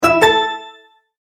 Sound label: multimedia tone verified